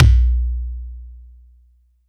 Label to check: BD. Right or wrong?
Right